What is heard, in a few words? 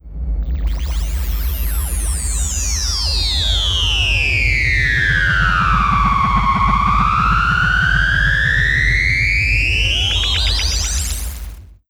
Screechy, Analogue; Zero-G Ambiosis